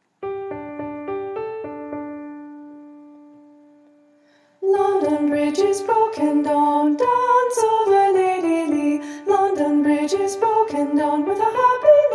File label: London Bridge With Piano Unison; studio